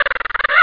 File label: WASP